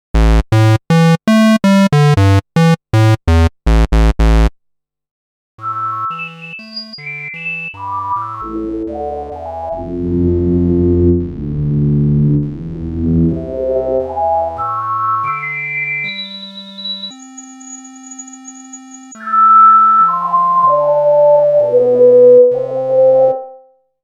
yamaha ex5r sounds fdsp water square raw and water